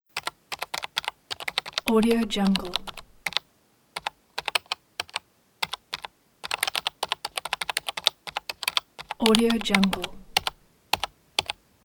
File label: desktop keyboard typing